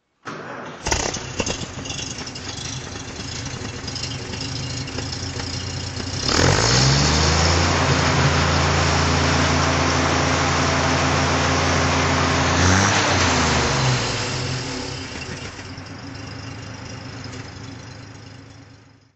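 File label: rolls royce phantom throttle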